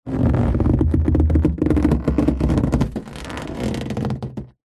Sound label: balloon inflated rub movement creak